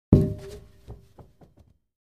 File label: Free Weights Exerci